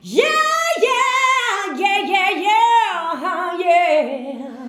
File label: "YEAH YEAAH", FEMALES; Best Service; Hyper Dance